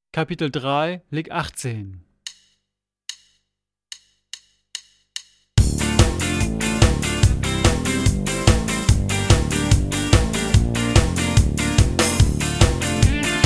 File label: Latin Style Guitar